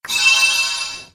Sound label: chime; raising heart; nanoha